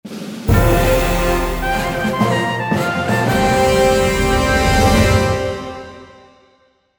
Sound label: Medium Victory; CivCity Rome